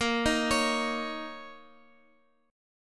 A sharp Minor Double Flat 5th, A sharp, down, Guitar, Open F; A#mbb5 arpeggiated; JGuitar